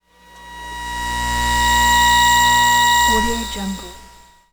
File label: lost transmissions tonal passbys; soundmorph